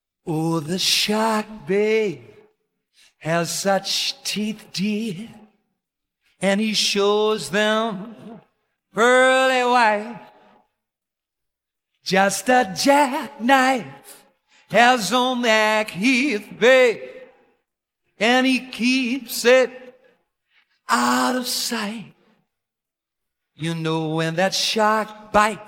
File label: Vocal